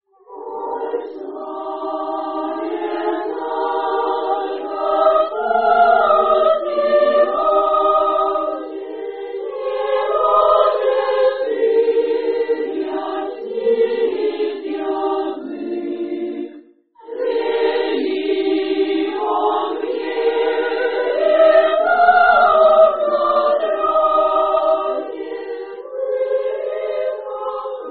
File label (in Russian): Хор старших классов; Играют учащиеся и выпускники; Д. Бортнянский; Коль славен